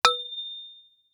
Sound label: Impact Cement Hit By Large Metal Pipe